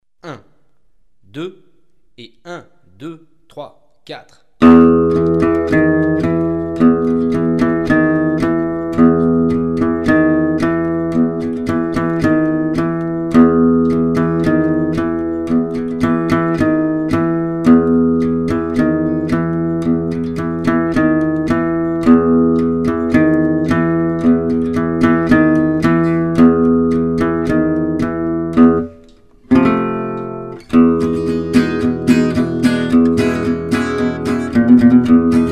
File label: Manouche; MyVirtualBand